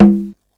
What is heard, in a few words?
Mid Bongo, Elka Drumstar-80 drum machine; 300 Drum Machines